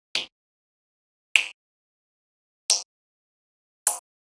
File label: Generic Click Digital; boom library; The Interface